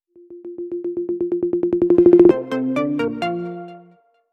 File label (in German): Das Soundlogo; Mit Delay; PopArt; leinenweberei seegers sohn